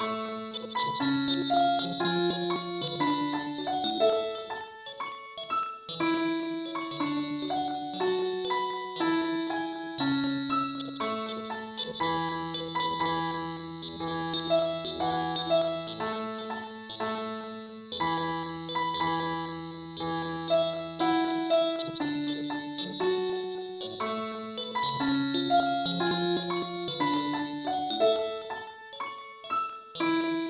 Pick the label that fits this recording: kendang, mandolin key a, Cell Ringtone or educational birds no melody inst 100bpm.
mandolin key a